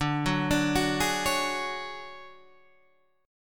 D Major 7th Flat 5th Guitar Half Step Down, chord

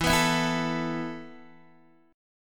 E Suspended 4th, Guitar DADGAD; x,7,9,9,7,7; down

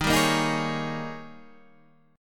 D sharp 11th D sharp

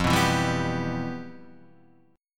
E Minor F, chord; Em/F strummed; JGuitar

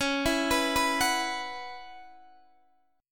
C sharp Minor 11th C sharp, Guitar DADGAD; C#m11 arpeggiated

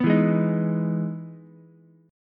E Major 7th E down Ukulele; EM7 strummed; JGuitar

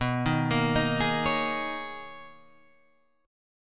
B Major 13th B down

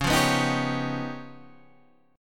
Db 7th Sharp 11th Db; strummed; JGuitar

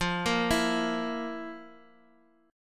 F Major 7th Suspended 4th, chord; FM7sus4 arpeggiated; JGuitar

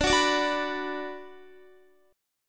D 6th D down Guitar, chord

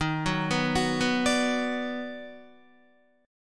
Eb Minor Major 7th Sharp 5th, Guitar; EbmM7#5; arpeggiated; JGuitar

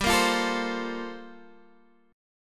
G Minor 11th G down; Gm11 strummed

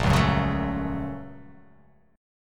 A sharp Minor Major 7th Sharp 5th A sharp

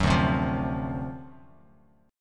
D 7th Sharp 9th, Bass Standard; D7#9 strummed; 14,5,10,10; JGuitar

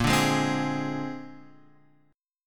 A sharp 9th A sharp Guitar Open F; strummed; JGuitar

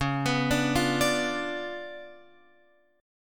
Db 7th Flat 9th, Guitar, Open F; x,8,11,9,8,9; Db7b9 arpeggiated

